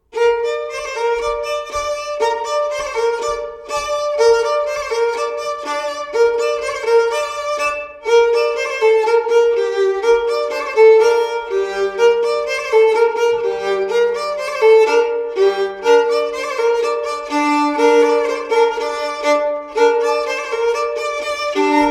Bourree saintongeoise; As tu vu la lune Jean

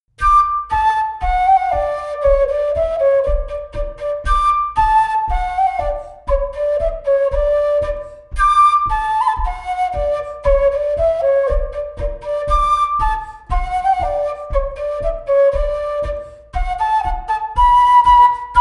Bourree

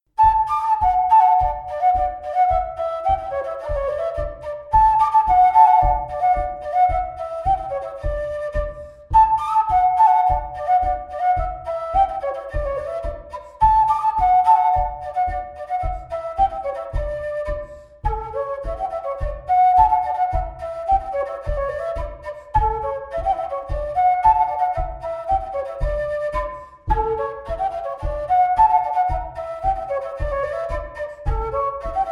Bourree; Recueil De Raoulx

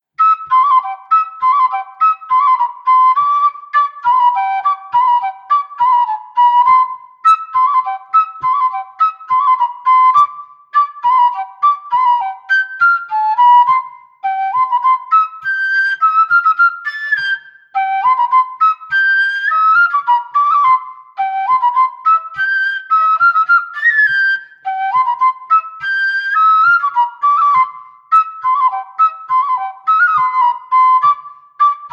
Airs limousins Bourree; Lagueny; 1er theme

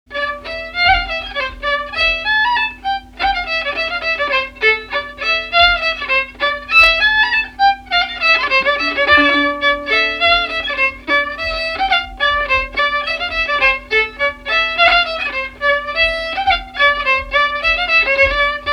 Polka; Polka du chabrit de Bagnols

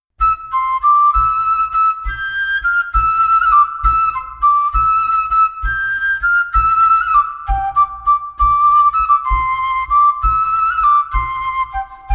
Montagnarde

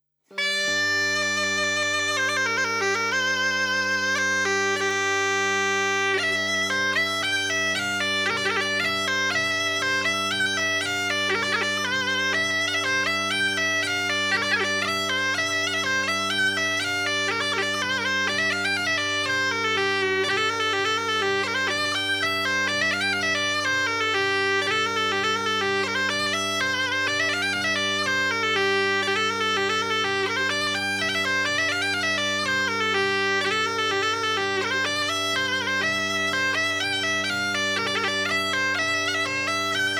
Polka; Polka dYhtier

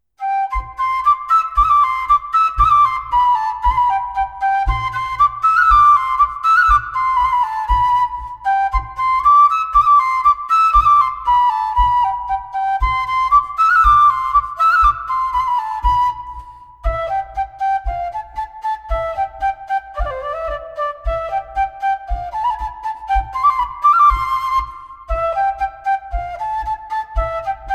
Bourree; Villebichot

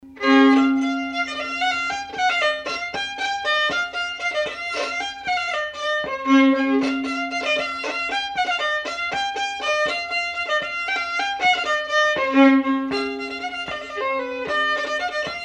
Bourree; Bourree de Jabut

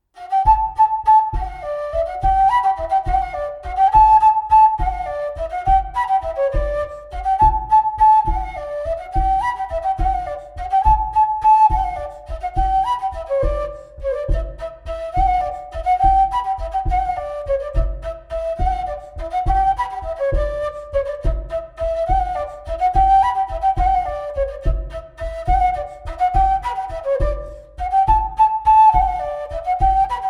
Montagnarde; Recueil De Raoulx

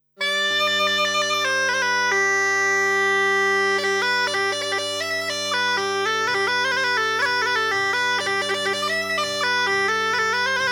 Polka; Polka de Meymac

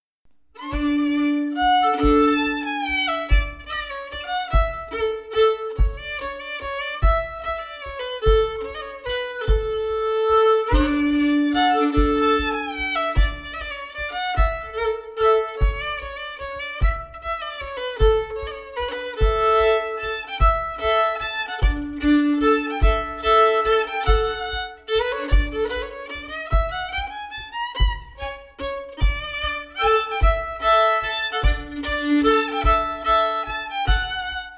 Valse; Les premieres valses 1ere livraison manuscrits regionaux

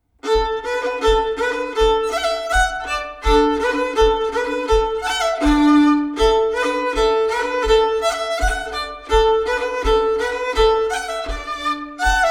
Scottish Brise pied

